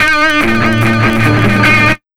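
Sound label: guitar, Big Beat Drumloops, electro 125; USB Soundscan vol.25; AKAI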